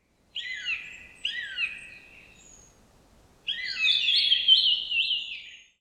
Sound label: Siberian jay, پرنده جیجاق سیبری